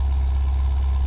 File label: Idle Nissan Z350